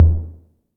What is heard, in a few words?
Percs